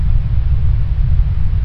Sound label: rumble